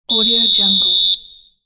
football whistle

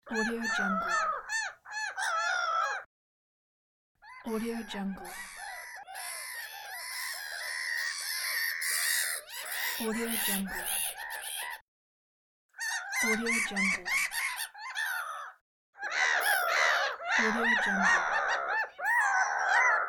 seagulls; warpjumper

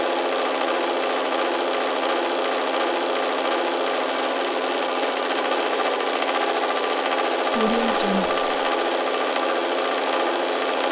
rattling machine

